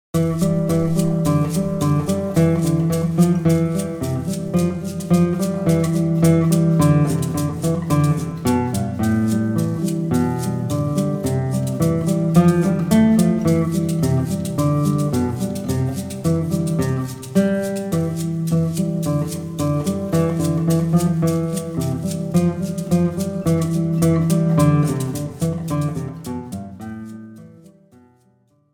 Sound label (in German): Eine kleine Zupfmusik, Play Guitar; Play Guitar Powersteps 1